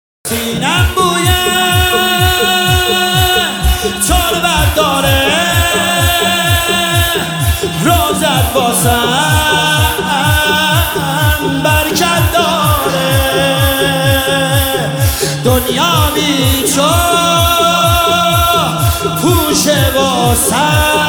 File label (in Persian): مداحی احساسی